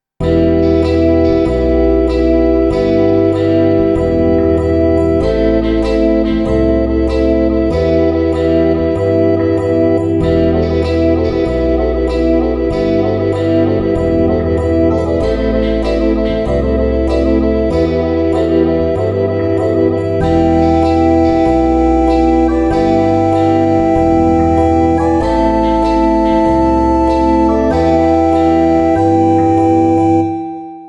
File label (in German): Blockflötenquartett in Em 96